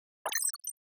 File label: glass break